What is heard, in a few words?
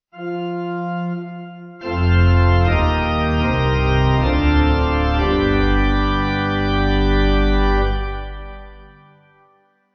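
Gr Amen Pipe, Pipe Organ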